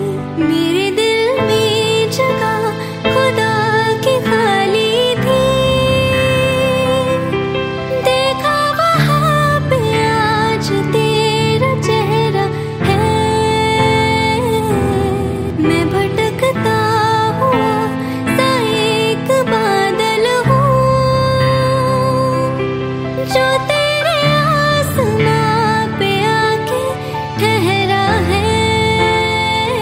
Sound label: Female